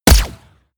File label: gun shot silencer designed ricochet